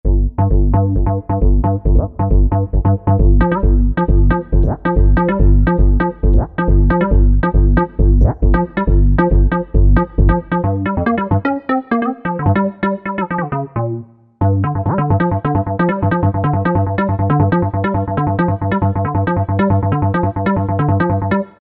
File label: 303 Like; Generalmusic Equinox